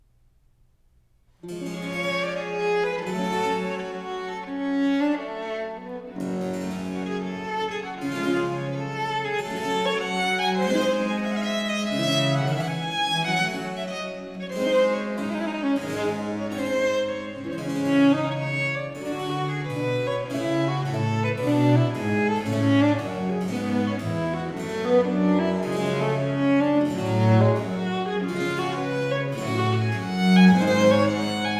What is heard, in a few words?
Ayres for the Violin, Suite in G Minor, Preludio in ostination; Book 2; Preludio in ostinatione; Italian Baroque Violin Sonatas; Nicola Matteis; Ayres for the Violin, Vol.1; 尼古拉斯 · 麦克吉根